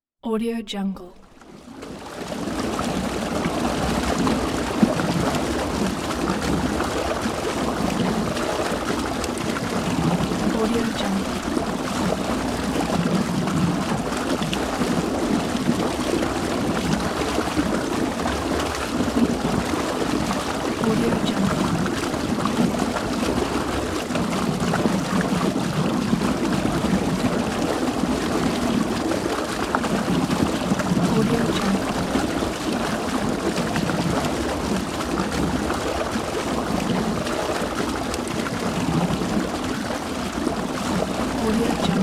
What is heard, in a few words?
flow gurgling river